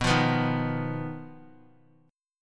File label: B Major Flat 5th B, chord; BMb5; strummed